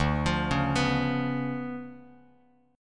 D Minor Major 13th, down, Bass Standard; DmM13 arpeggiated; JGuitar

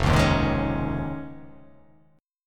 F Minor 7th Sharp 5th

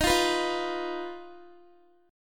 Eb Suspended 2nd Sharp 5th, Guitar

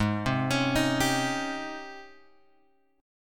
G sharp Major 11th; G#M11 Chord {4 3 X 6 4 3} arpeggiated

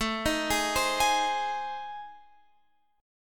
A Minor Major 7th Flat 5th

